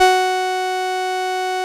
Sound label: 505, String Machines; Best Service; XX Large Most Wanted 2; PARA-505